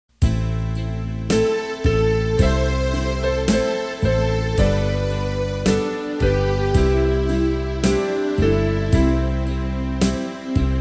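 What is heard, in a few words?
soprano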